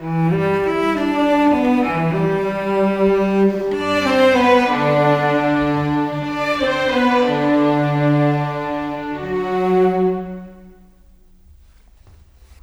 Cello, Violins, Orchestral, Rock Pop; Sonic Foundry (Sony Creative Software); Orchestral Series VOL-4 - Rock and Pop

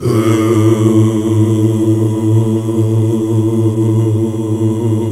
GOSP. OOOHH; Sound & Vision - Gigapack II CD 1 (Roland); HAL Gosp. Oooh 2

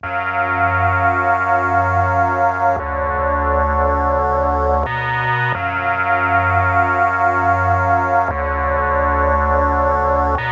Melodics; neuro; Optiv & BTK

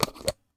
Jam Jar Lid, household rhythm samples Hits

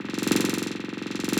LOOP, 170 BPM; Ueberschall; Drum & Bass Flash Trackz

